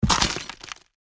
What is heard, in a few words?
Footsteps on broken glass boots single step; impacts; gfxsounds